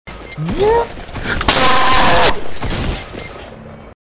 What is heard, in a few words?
explosive anger